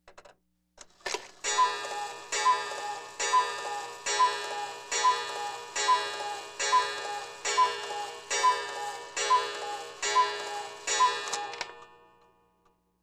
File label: Clocks Cuckoo